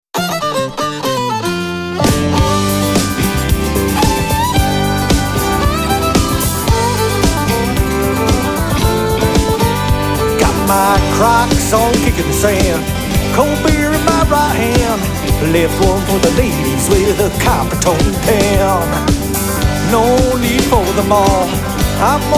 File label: country band